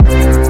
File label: Kick; Resan; RnB